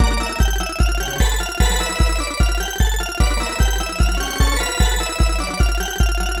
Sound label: Clockwork Machines B - Lovely